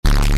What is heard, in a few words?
boosted censor